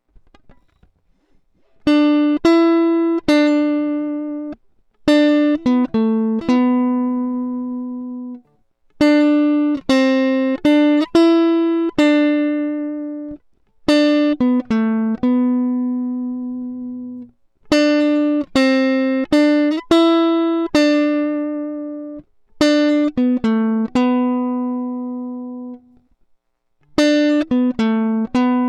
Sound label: e. gtr